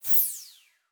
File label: Multimedia Internet CD-Rom Flash Whoosh Short